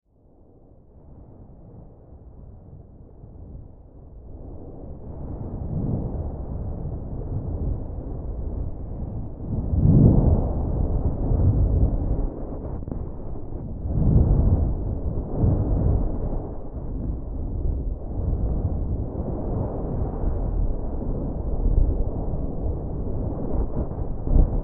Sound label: loud atmospheric